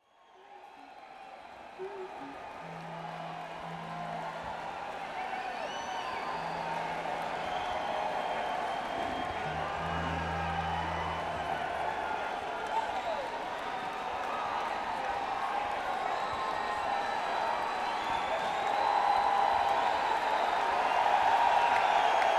Tuning; Burgettstown, PA; Post Gazette Pavillion

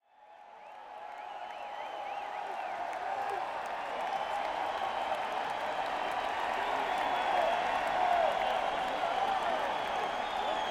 Tuning; Worcester, MA; DCU Center

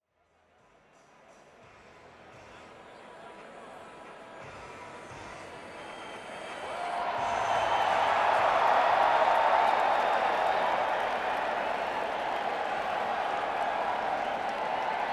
Crowd; Worcester, MA - DCU Center

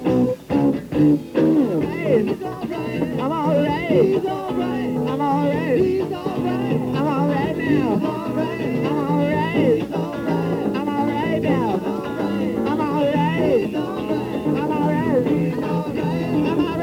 Alumni Blues; Burlington, VT; University of Vermont

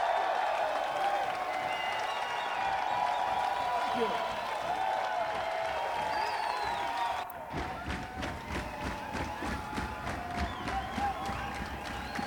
Crowd; Saint Louis, MO; American Theater

